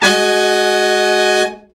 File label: BRA SECT; BRA SECTIONS